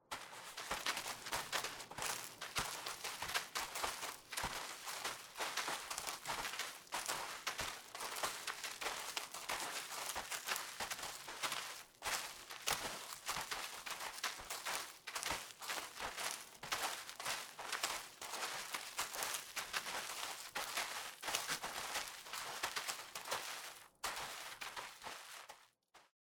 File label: Footsteps Walking in snow Group; audiopostproduction